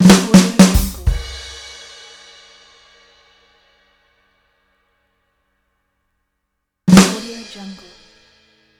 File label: the cartoon drums